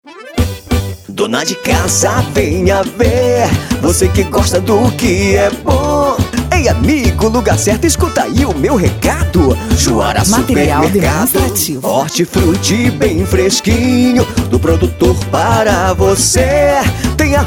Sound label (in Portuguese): jingle supermercado; juara